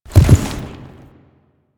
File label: Horse Jump Land Heavy; Foley Actions; Warframe; Vehicles; Duviri